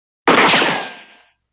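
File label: Pistol Interior Shot Ricochet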